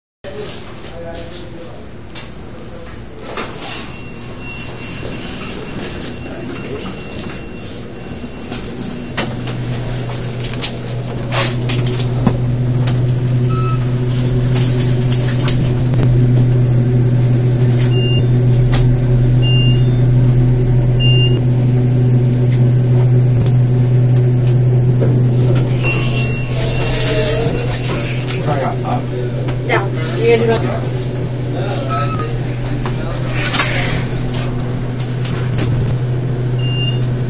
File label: Elevator; Breslin Hall